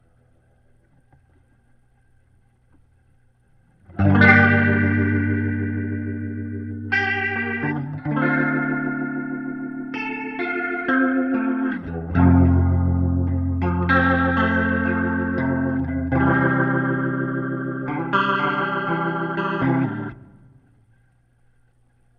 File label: Leslie gtr